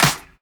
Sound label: Claps & Snares